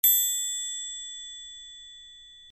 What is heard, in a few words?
Click; conservation catapult; project green